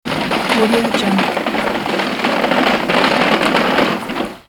rolling cart

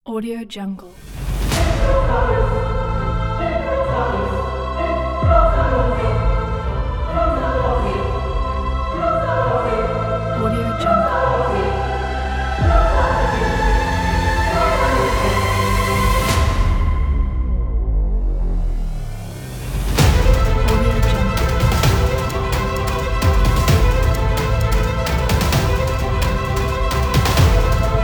cinematic epic trailer

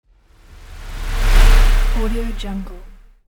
fly

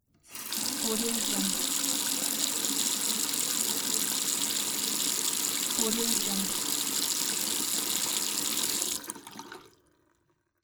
faucet running water

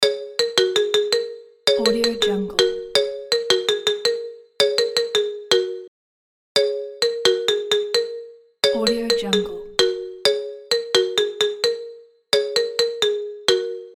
marimba ringtone

